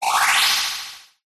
cartoon ascend sci fi warble rise panned delayed high pitched; zapsplat